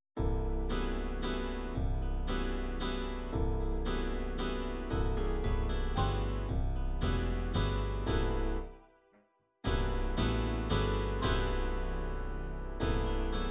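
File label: country waltz, midi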